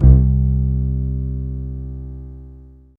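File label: acoustic bass C3; Greytsounds - Scott Peers Sound Engineering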